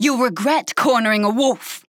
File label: Taking Damage; Tyra